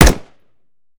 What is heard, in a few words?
mike4 fire, weapons; gmod; viper